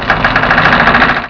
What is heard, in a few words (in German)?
Kiste